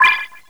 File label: level; stack jump